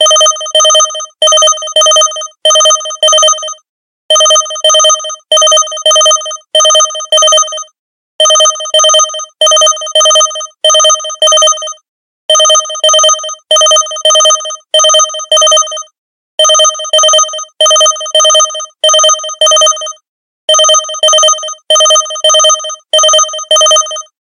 Simple Beep

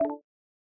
Dock, OnePlus ui; OnePlus 5

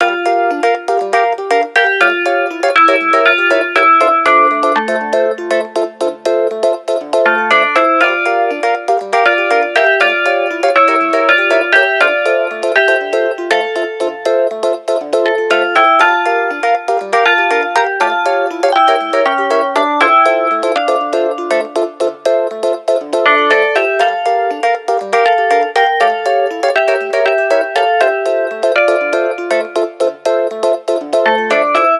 simple, ringtones; SK telesys SK-S100

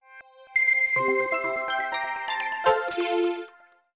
Power Off Sound; Samsung Galaxy U SHW-M130L; LGT

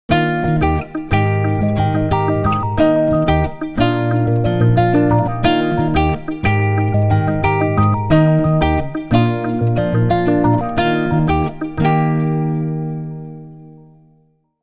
Guitar Trip, ringtones; LG V31 isai VL

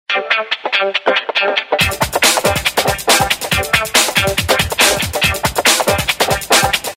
Vivid street, Samsung; SGH-A561

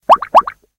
music drop; Sony Ericsson A8i

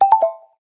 Key IM; LG Wine Smart F480K

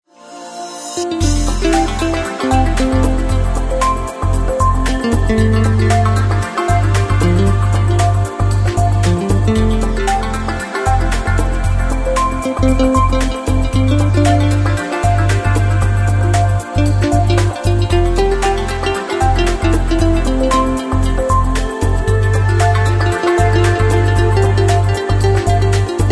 Nokia Ringtones; 2630; Liquify

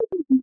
Low Battery

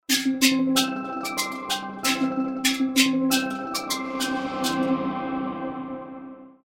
Festives, ringtones

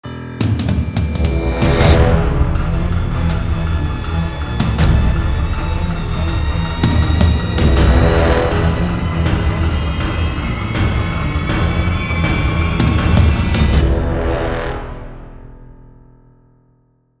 Thriller Suspense

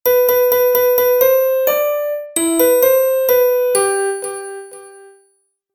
poweron; Telecom Personal

